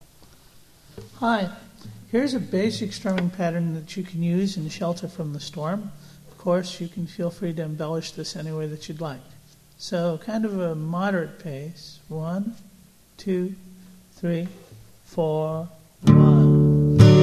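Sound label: open tuning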